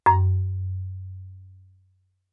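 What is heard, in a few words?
Marimba rubber fortissimo Gb4; MIS Pitches - 2014; Percussion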